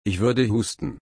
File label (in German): husten